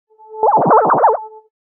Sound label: Laser Glass Ripple, science fiction sound effect